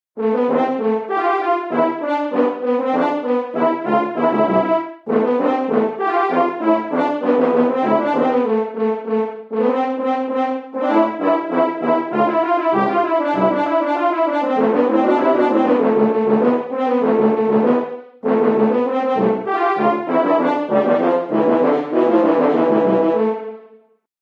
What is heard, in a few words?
Horn